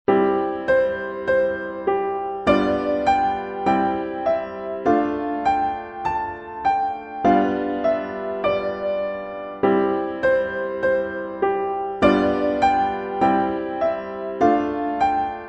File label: piano miniature